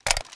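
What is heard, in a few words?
assault rifle reload magazine hit, weapons; viper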